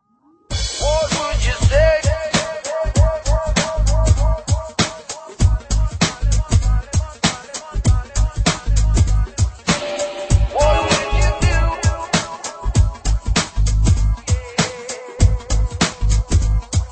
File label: dubb version